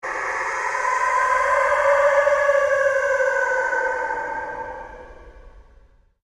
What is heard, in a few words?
PULSE LONG SWEEP